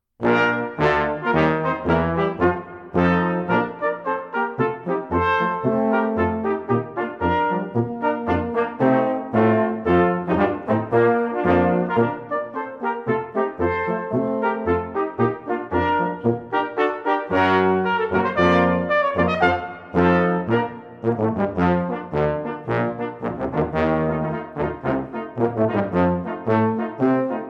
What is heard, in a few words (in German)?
Drahrer Marsch